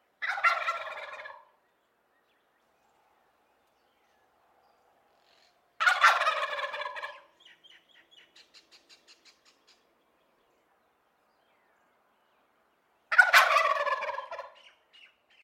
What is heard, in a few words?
turkey; emiquon virtual tour; birdwatching; illinois